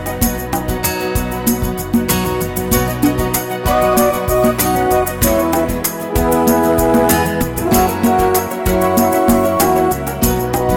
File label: Pretty and Slow